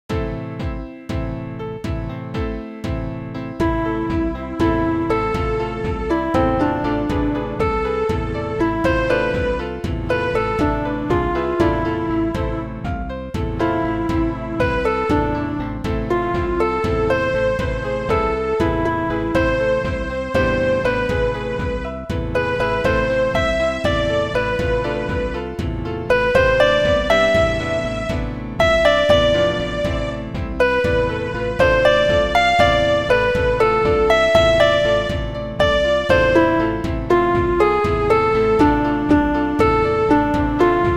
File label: piano